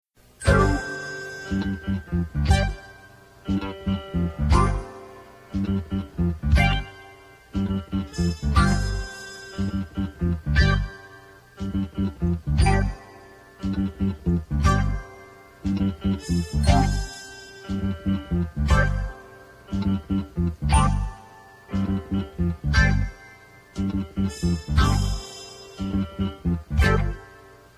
think music

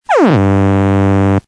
sfx trap

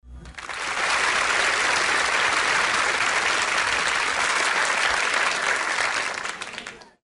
sfx applause

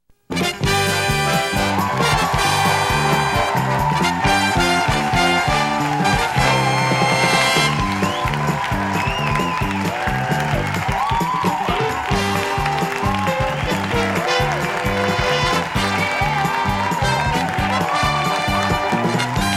car theme with cheers

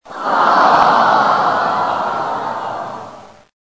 sfx audience groans

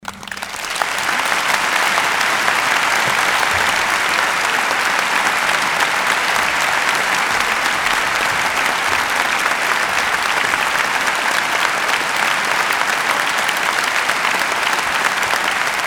applause long

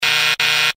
buzz buzz

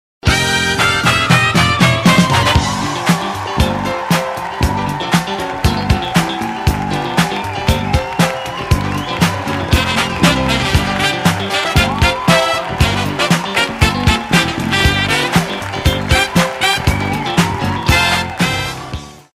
commercial theme with cheers

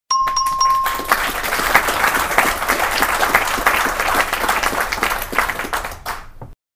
3 dings applause

